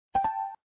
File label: message